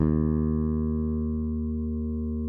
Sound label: SPEC SUSTAIN, Bass; D#2; Sampleheads; Will Lee Bass Library